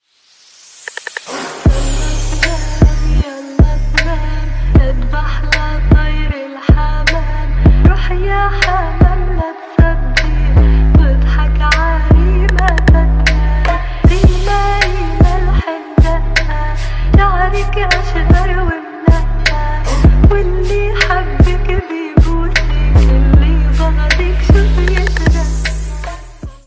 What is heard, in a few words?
trap